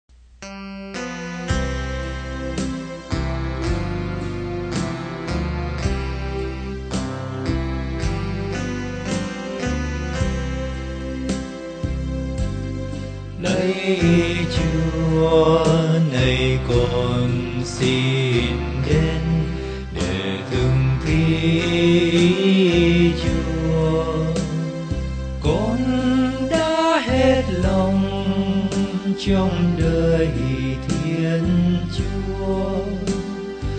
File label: BASS